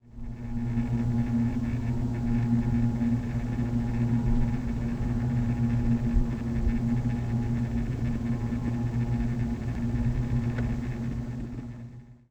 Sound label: Power Line Hum